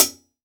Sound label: PS 2002 HI HAT